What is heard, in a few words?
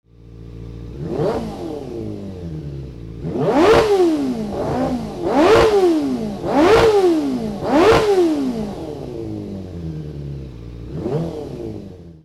Drysdale 750 V8